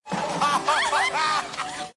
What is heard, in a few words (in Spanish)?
risa viejo; de la tele robocop